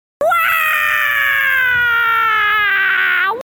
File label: woaaaaaahw